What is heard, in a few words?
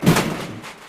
hit bin; Gumball - Go Long!